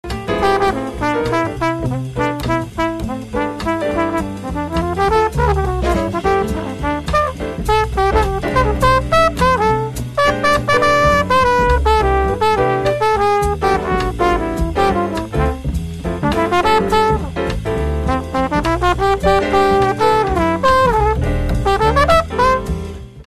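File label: flugelhorn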